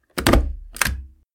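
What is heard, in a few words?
sound of stapling paper single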